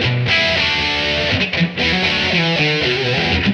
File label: 122 rhythm